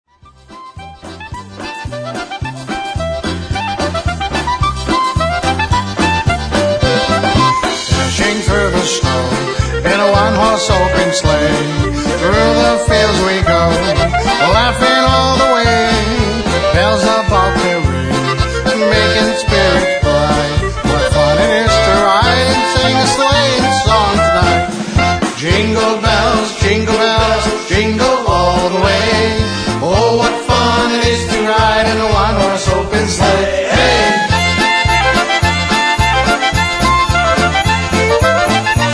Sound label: jingle bells, polkas